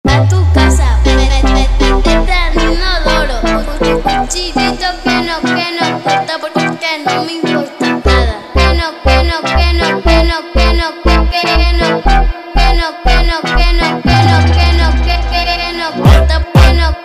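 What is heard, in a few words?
phonk music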